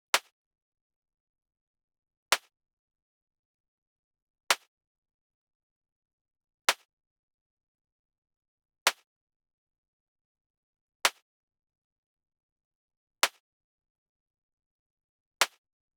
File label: 110bpm Snare Highs Top A m